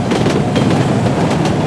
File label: trolley; WhiteOut